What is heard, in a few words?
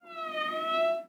door sound